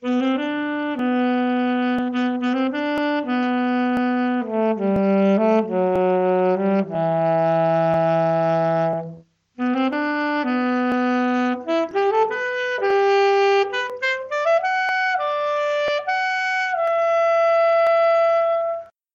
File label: Saxophone